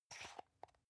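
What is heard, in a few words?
toothpaste open hard, Bathroom; haus-garten; badezimmer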